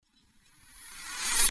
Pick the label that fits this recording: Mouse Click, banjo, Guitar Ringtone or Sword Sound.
Sword Sound